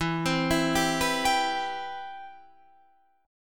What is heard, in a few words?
C Major 7th, E, guitar chord